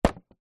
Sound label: foley knife serrated stab into empty plastic milk bottle